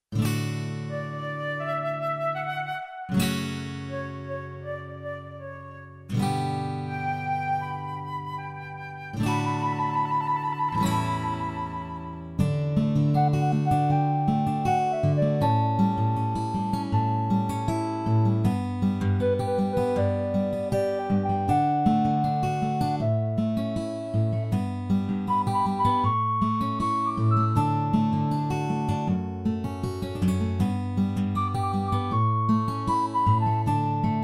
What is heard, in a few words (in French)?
cent mille oiseaux inst